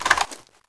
m16 clip out; weapons

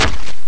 axe hit bod; weapons

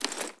flare deploy; weapons

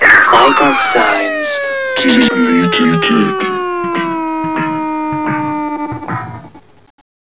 death; sven; zombie hev

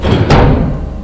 bulge; ambience; caged

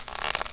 xbow bolt; weapons

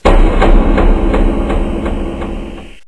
checkpoint; aomclassic; sven

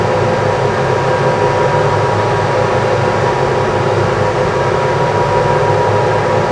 ambience; caged

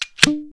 m79 shell in; weapons

